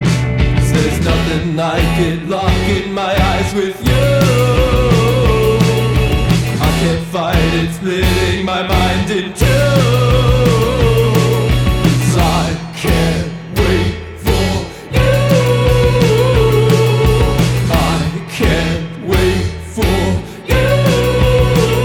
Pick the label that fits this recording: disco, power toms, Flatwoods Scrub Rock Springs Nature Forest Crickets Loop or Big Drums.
disco